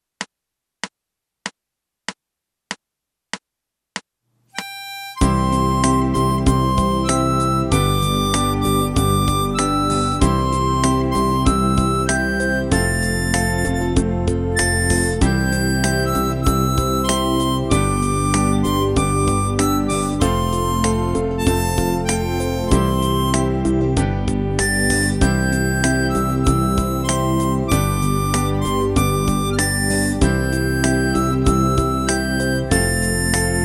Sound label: Auld Lang Syne harmonica